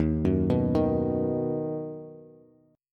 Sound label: Eb Minor Sharp 5th Bass; Ebm#5 arpeggiated; JGuitar